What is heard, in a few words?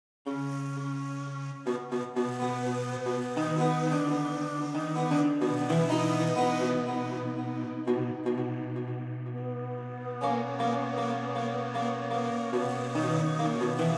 World Instruments